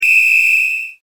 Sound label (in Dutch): fluitje politie